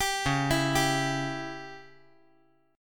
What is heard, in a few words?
Db Diminished Db down